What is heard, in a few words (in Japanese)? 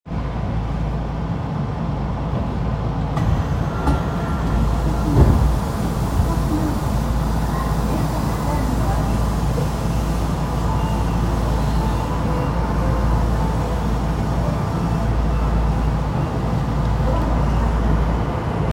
銀座線三越前西, train sounds